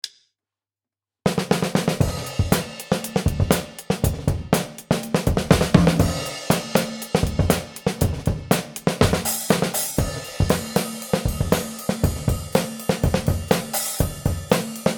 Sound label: Rough Drum Mix